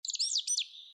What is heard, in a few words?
Bird